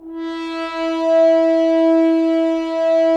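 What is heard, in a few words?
Solo French Horn, brass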